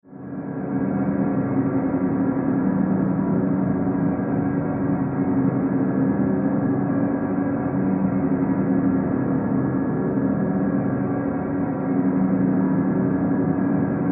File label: Horror Drone Organ Ghostly Scary; Scary Tones; Blastwave